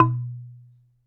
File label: Wooden Bar Strike